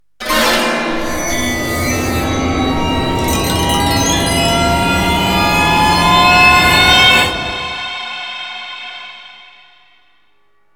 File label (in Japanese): 和物心霊特集 ジングル, japanese ghost jingles; 貞子に襲われそうなジングル